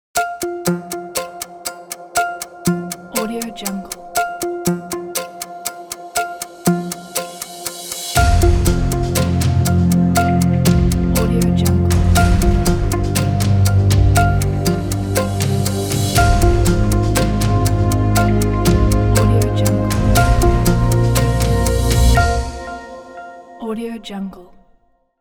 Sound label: a quiz countdown ident